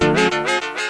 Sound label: chord